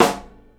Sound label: SNARE, Live Snares